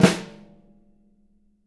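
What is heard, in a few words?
jazz snares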